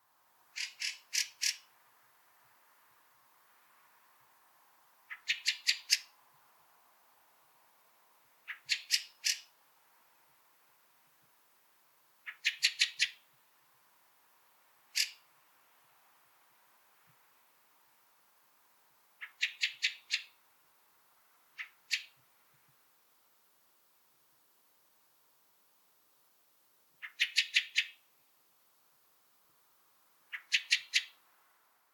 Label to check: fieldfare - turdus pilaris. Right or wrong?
Right